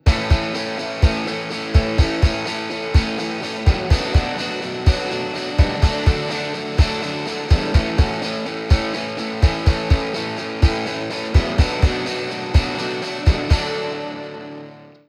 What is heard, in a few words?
Kick Gtrs SC Dyn